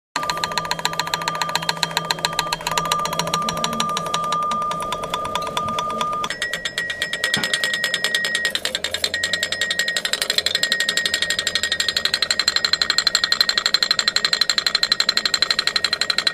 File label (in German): Magnetruehrer